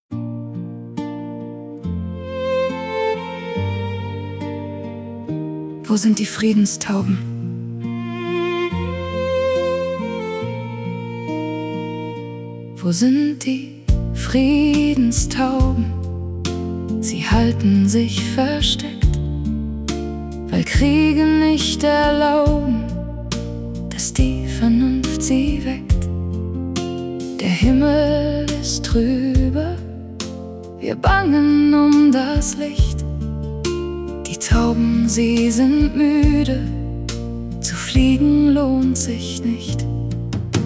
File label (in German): wo sind die friedenstauben female